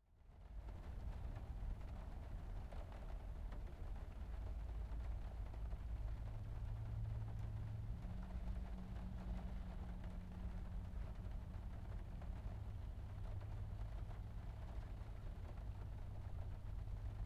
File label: Ambience Rain From Inside Car; AdobeCC